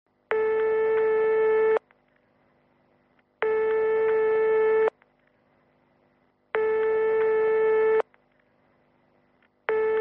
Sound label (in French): sonne tel attente